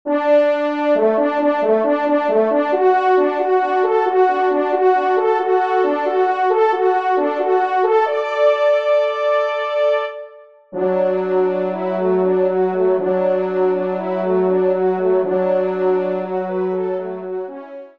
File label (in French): TRP; Guillaume Tell; Gustave Rochard